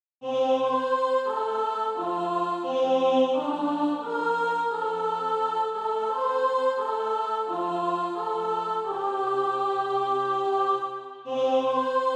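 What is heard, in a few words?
soprano, SATB Practise Music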